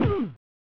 "Ugh!"; clue 1992 snes

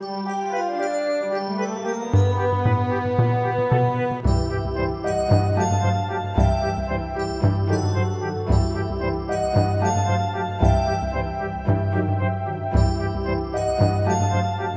Underwater; super mario maker 2; SMB3 Underwater - Edit

